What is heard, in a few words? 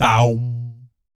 BS, Vocal BASS FX; ILIO; Vocal Planet VOL-3; Jazz & FX